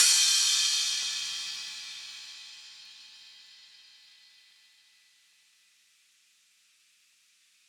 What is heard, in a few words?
crash; eddyflux; dough-samples